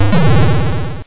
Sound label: push; shrdlu